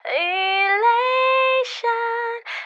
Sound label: Vox; Pro Tools Essential Demos